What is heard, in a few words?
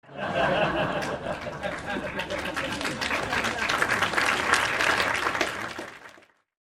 laughter